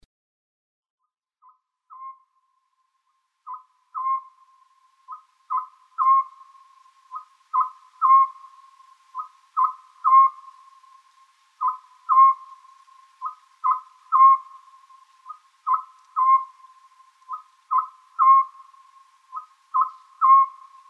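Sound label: Oriental scops owl, bird; konohazuku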